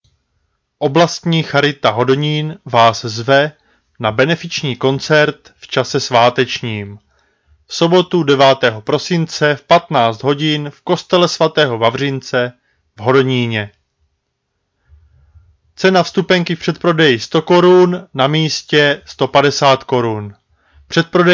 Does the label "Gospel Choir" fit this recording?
Yes